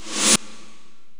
Korg x5, Drums

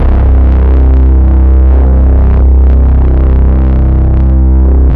SYN BASS

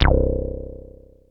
Bass Synths hits and octaves

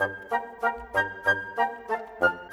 Bassoon Flute, Orchestral; Sonic Foundry (Sony Creative Software); Orchestral VOL-2; Modern 29

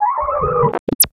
DATA THUMP, MACHINES

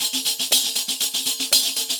DRM

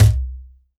WORLD DRUMS MIXED DRUMS

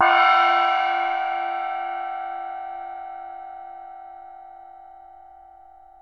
TIBETAN, World Combo

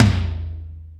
tom stack; Greytsounds; Scott Peers Sound Engineering

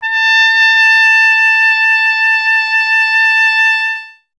TP A; Miroslav Vitous - Solo Instruments VOL-1; Trumpet

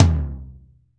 TOM; Northstar - Drumscapes Roland

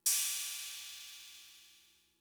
Cymbal, Fricke MFB-522, Drum Machines

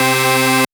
Synth, DnB One Shots

